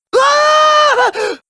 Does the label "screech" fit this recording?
Yes